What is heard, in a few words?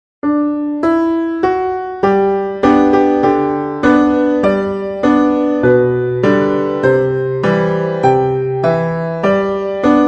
Red Wing alt. B Melody